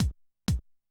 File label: 125, Classic Drum Loops